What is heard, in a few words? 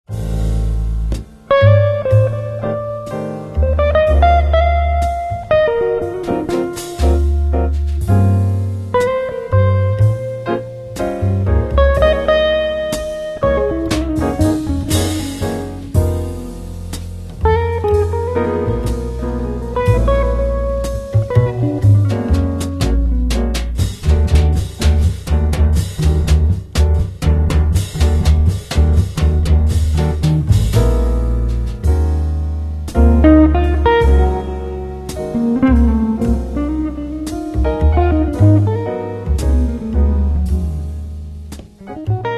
guitar studio